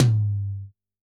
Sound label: Tom, drum